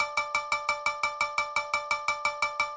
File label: respin animation above; games; Aztec Gold Megaways